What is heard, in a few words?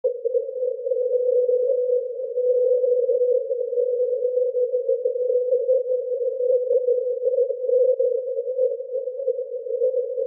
5 long pips